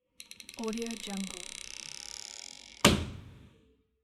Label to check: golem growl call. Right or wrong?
Wrong